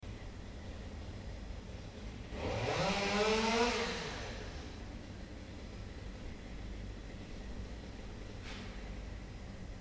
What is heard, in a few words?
chainsaw